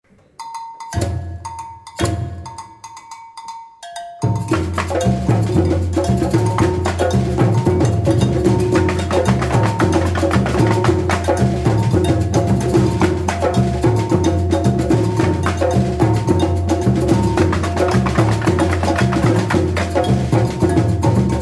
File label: samba